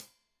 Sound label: Hat Tight, Hi-Hat, Cymbals; Deeply Sampled Drums